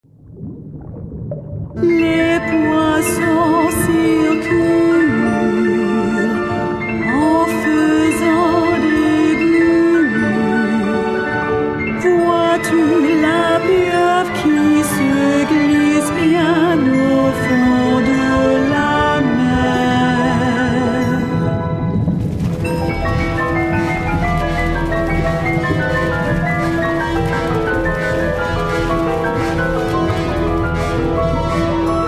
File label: Aquarium vocale